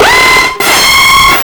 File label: NUCLEAR, Hard & Loud Techno